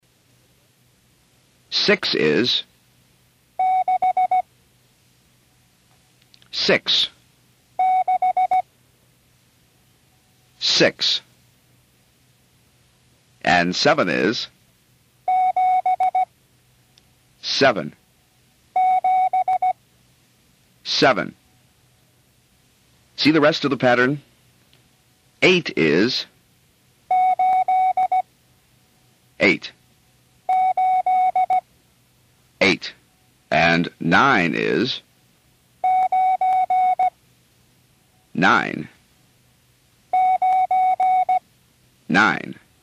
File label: numbers 6-0, Morse Code; Tune in the World